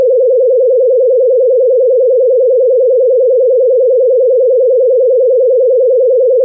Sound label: laser treatment, Electro and Synthetic